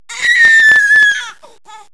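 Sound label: baby scream; Kaboom! Junior; human babies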